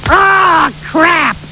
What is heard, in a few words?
squish